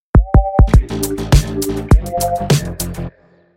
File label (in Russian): сбивка; audiobrending dlya podcasta